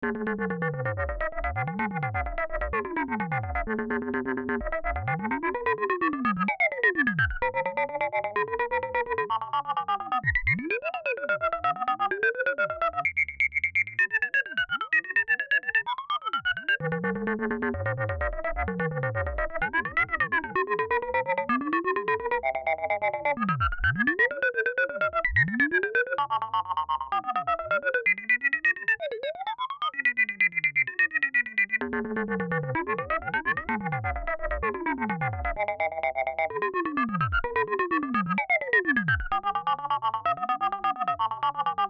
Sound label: Triangle LFO Vactrol PAN